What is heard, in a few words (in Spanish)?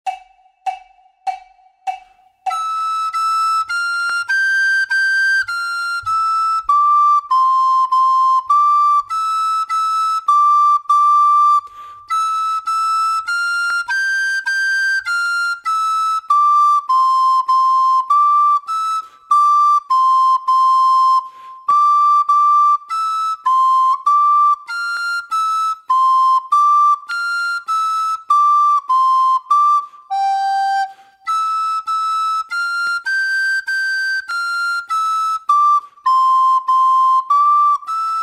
FLAUTA SOLO